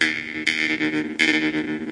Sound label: jawharp bassline